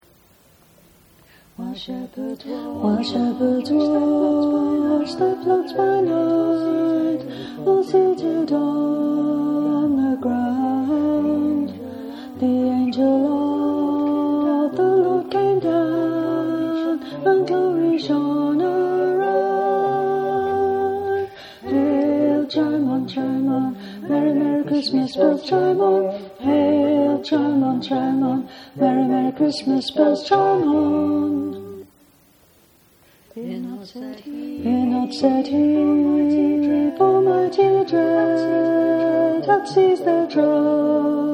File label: Alto